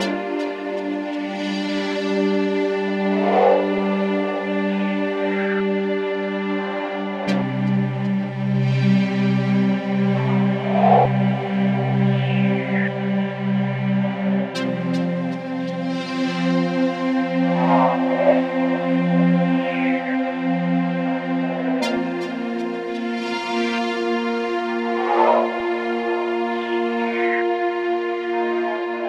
Pad; Dance

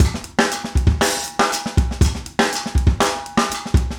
break 120

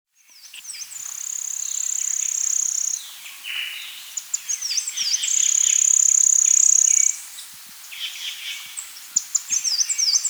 Gray-Mantled Wren; Odontorchilus Bra; S. America

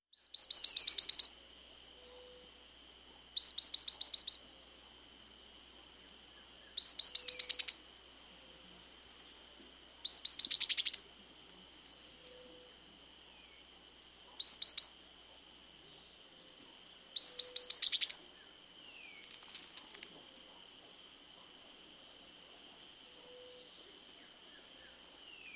White-lored Tyrannulet (Ornithion inerme); Peru; S. America

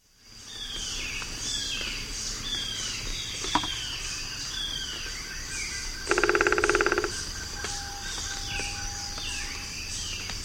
Rufous-winged Woodpecker, S. America

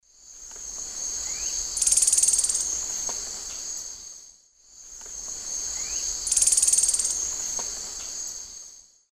Epinecrophylla fjeldsaai, alarm call; S. America; Eastern Lowlands

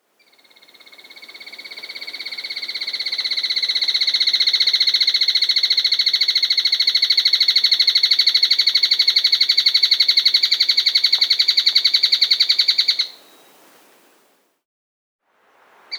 Trilling Tapulco; Scytalopus Parviro; S. America

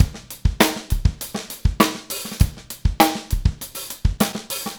Funkified, Rock Drums

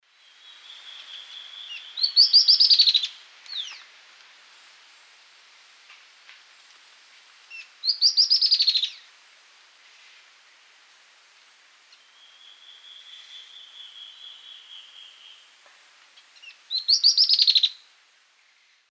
Microrhopias quixensis, song and call; S. America; Eastern Lowlands

